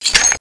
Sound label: chain off; nzp